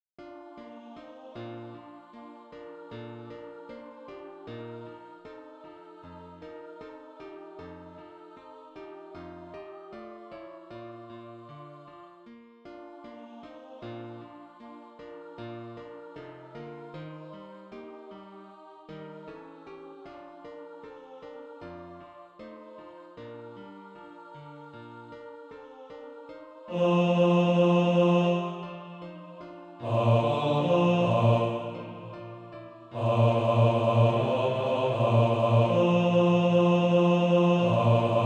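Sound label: bass, Practice Music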